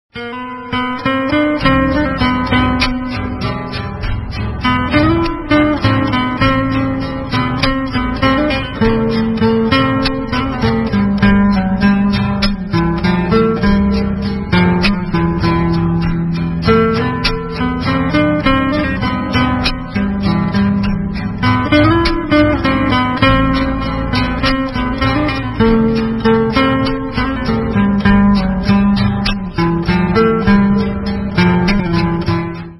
Guitar Ringtone

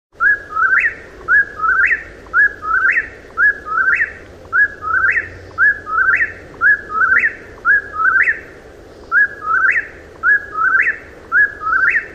Whippoorwill Ringtone

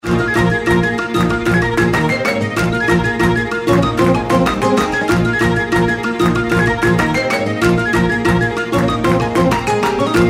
Belly Dance Ringtone

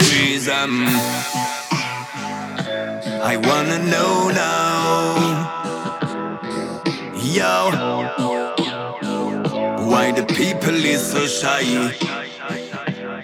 Vivo America beautiful ringtone

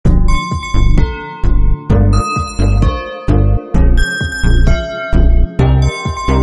Ringtone

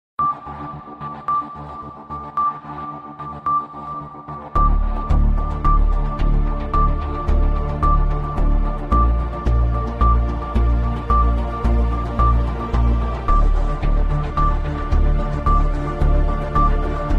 Oppo tone

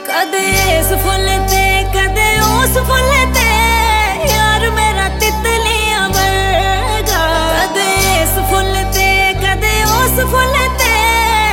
Punjabi Ringtone

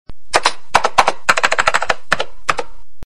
Keyboard Clicking Sound

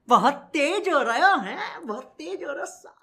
Funny Notification Tones

